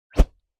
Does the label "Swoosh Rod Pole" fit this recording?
Yes